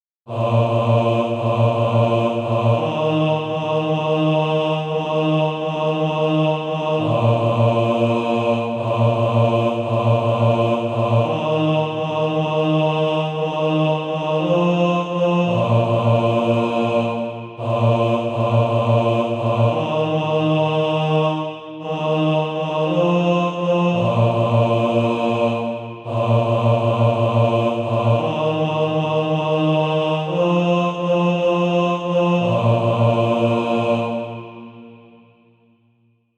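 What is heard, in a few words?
bas, Practise Music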